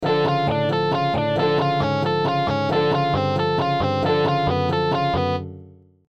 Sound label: Arpиge Bass; Scales and Arpeggios